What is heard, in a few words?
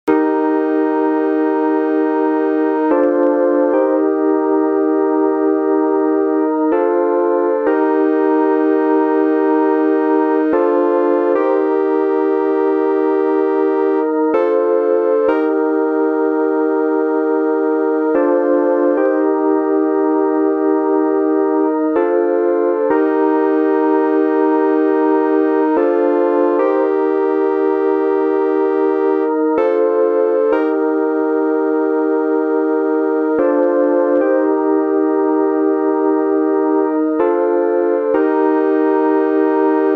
Vintage Square Fake wurlie Pad